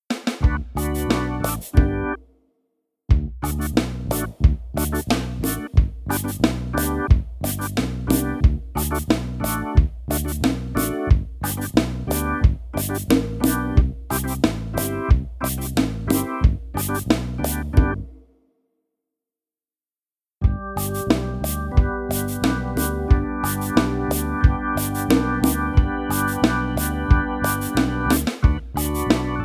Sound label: Instrumental